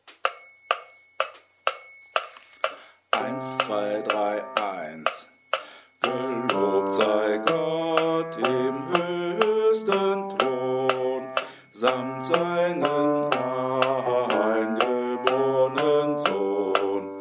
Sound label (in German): Gelobt sei Gott Bass